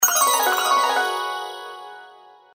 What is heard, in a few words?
outro sfx; CaiYuanGuangJin